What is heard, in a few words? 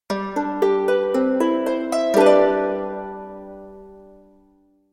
jingle